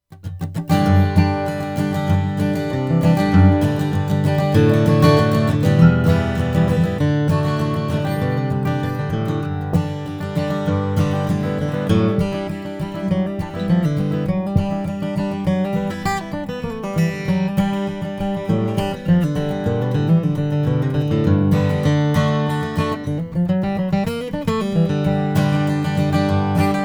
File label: martin hdn; negative limited black